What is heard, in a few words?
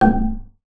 BASS FINGER; Xsample VOL-5